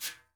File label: flick; Northstar - New Gold VOL-2; 30S BRUSH